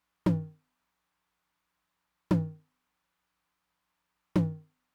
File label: Mid Tom, Roland TR-909; Roland TR-909 Ableton Project